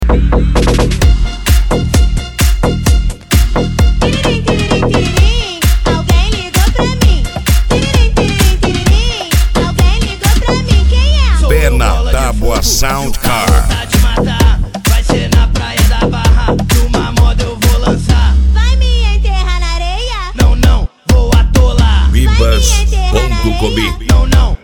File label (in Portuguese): sound car carnaval